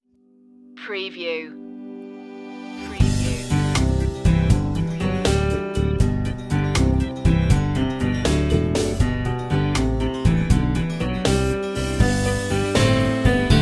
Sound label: humpty dumpty instr